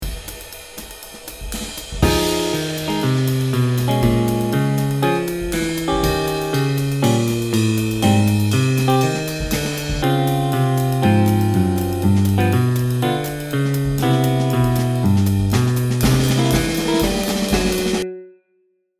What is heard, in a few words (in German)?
Walking Bass mit Harmonien und Rhythmus